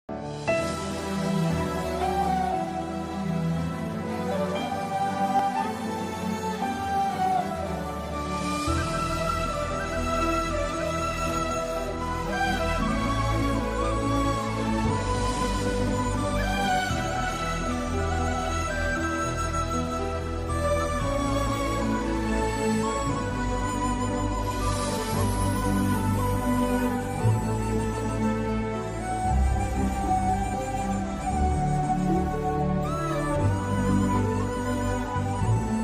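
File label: villain bgm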